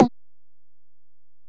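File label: Acoustic Percussion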